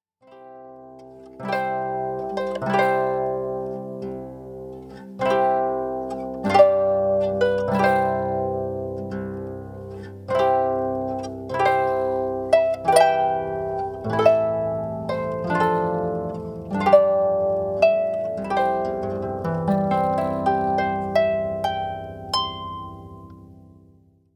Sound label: Bridal Chorus, harp; Here Comes the Bride